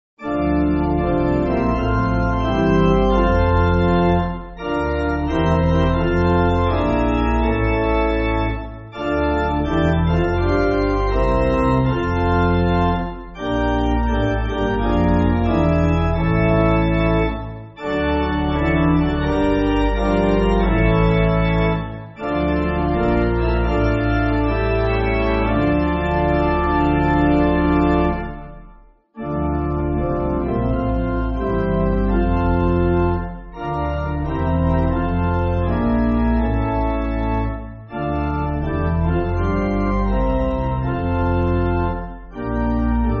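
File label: Pipe Organ, Backing Files for Churches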